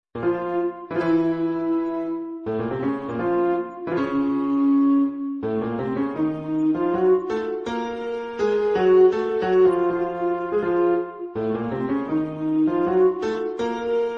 Flute Quartet w Piano; Samuel Goldenburg and Schmuyle; Pictures At An Exhibition

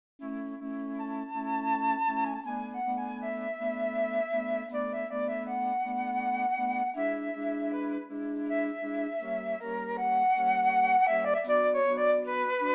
Borodin Nocturne for Flute Quartet; Woodwinds

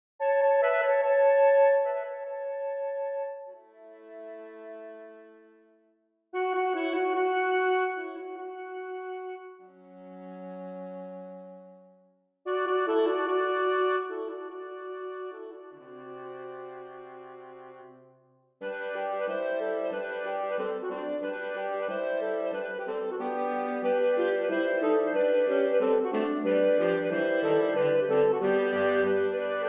Sword Dance; Duhamel; In Celtic Lands; Mvt 6

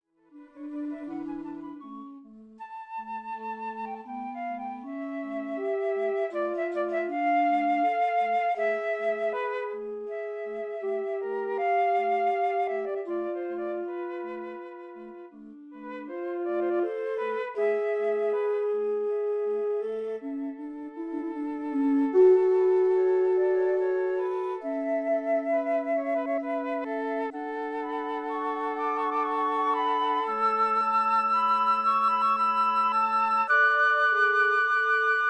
Nocturne for Flute Quartet; Woodwinds; Borodin

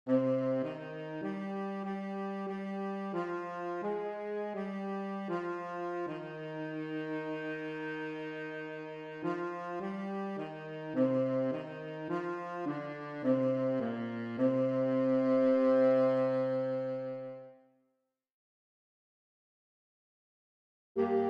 Traditional Coventry Bells beginning

